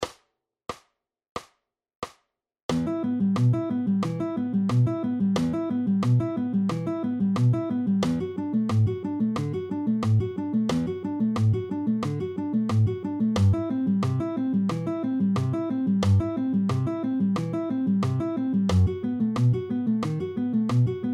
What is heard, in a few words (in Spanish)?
Audio Iniciacion a Arpegios, guitarra